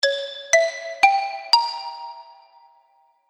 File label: Xylophone Horror Arpeggio, Musical Sound Effects